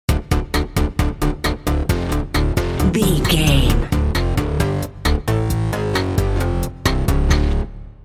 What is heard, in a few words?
character selection screen video game stinger